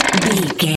gun auto barrel spin slow stop quick

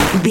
retro impact hit

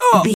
voice male grunt pain

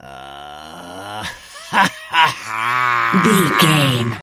voice male laugh crazy

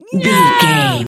voice female a battle shout

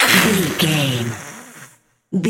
ghost witch voice hiss

